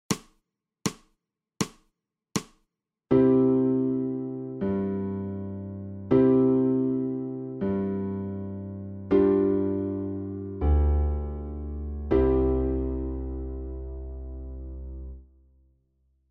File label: ALTO